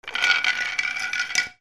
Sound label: FALLING